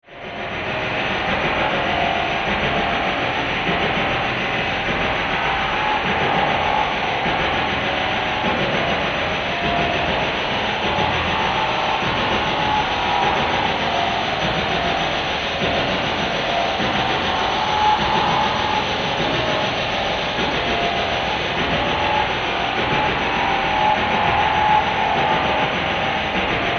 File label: pink noise